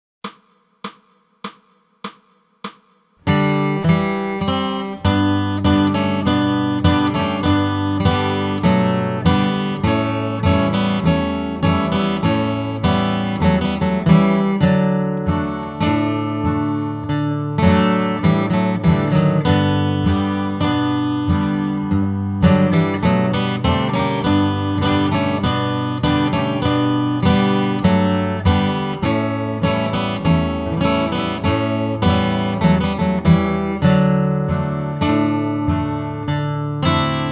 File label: Snow Deer Guitar 1 and 2 100